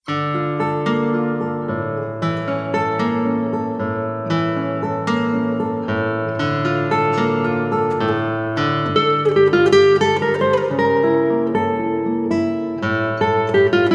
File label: milonga